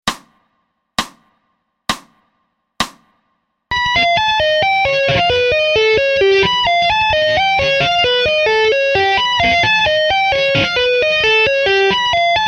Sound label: Whole Tone Taps slow